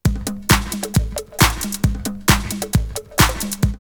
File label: Loop 134, drumloops Techno House; Slave Loop; X-Static Goldmine